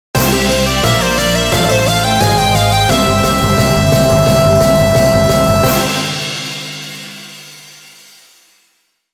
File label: Victory Fanfare; soldam kaika sengen original soundtrack 2017